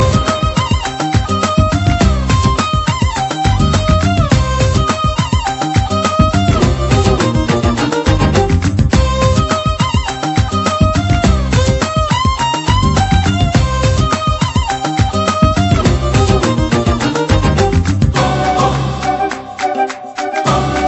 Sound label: Violin; Manmadhanambu